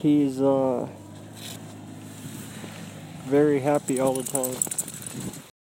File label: dog